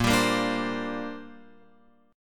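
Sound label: A sharp 6th Add 9th chord, Guitar, Open F tuning; A#6add9 strummed; JGuitar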